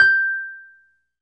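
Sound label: Wurlitzer electric piano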